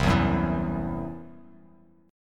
C-Major Flat 5th, Bass Standard; strummed

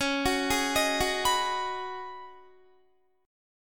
Db 7th Suspended 2nd Suspended 4th, Guitar; Db7sus2sus4 arpeggiated

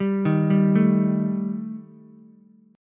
Eb Major Flat 5th Eb Ukulele; EbMb5 arpeggiated; 0,3,3,0; JGuitar

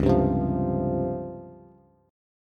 Db Major 7th Flat 5th, Bass; DbM7b5 strummed; JGuitar

